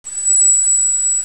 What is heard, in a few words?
trumpet